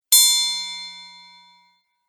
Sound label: Metal Ding, dong sound effects; multimedia sound effects